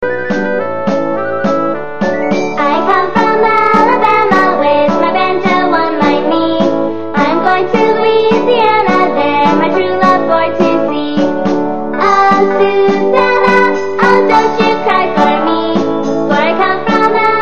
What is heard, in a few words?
banjo